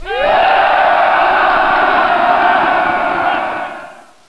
barbarian war cry; Caesar III

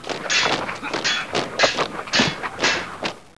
BARRACKS; Caesar III

